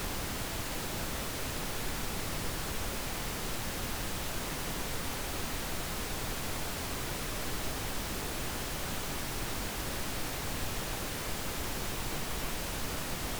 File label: pn full fr -20dBFS L+R